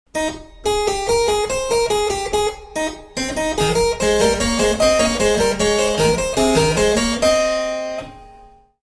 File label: bourree